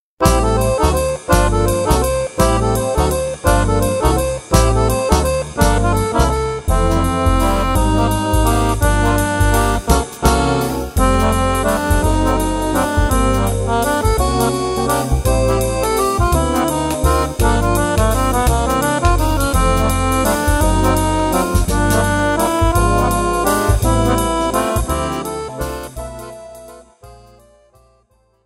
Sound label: jazz time